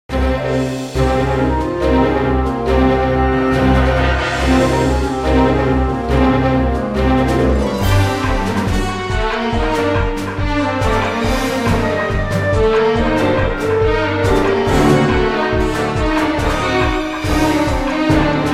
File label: Airfield Fast Amb; music; The Da Vinci Code; XBOX